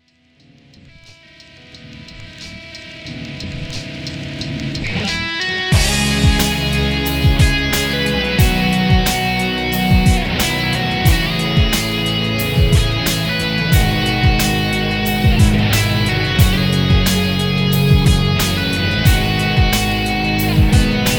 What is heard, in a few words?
rock